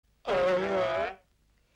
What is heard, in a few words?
ahaha